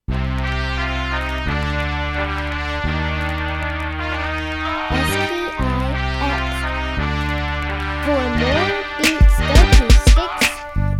crunk; crunk sole

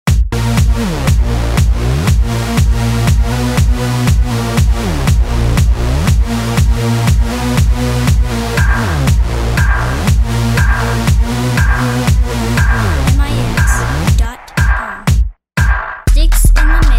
clap dance

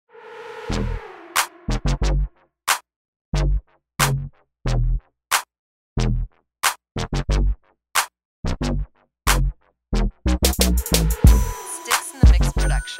Modern Mob Music

